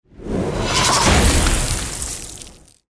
attack; hero sound

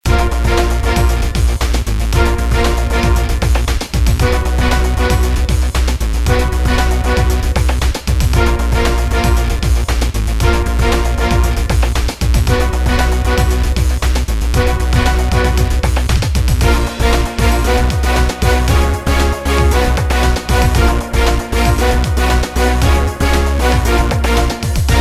battle

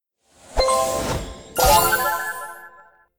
attack; hero

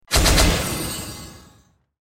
effect rain

